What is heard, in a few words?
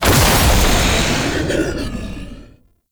Power Grid Button; Warframe; Duviri Murmur